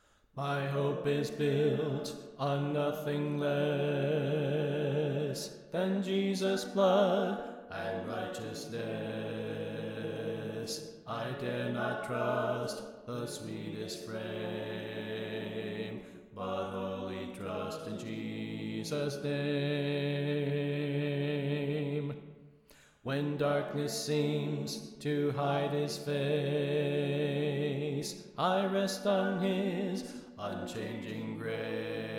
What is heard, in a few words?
Bass Left